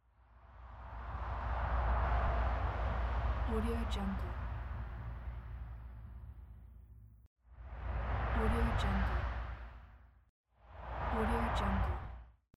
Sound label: whoosh packet